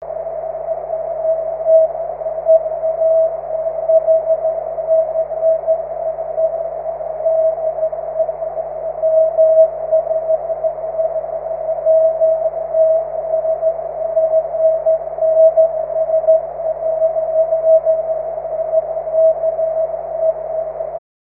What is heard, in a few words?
cw; 23cm